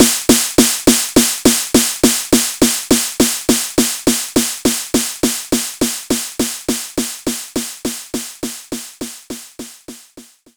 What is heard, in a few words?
TR 909 Snare; knights try